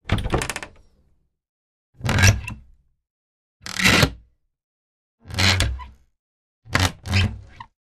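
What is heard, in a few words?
Door Furniture Old Open, hinges creaks; Door Furniture Old, Open,Close Fast x5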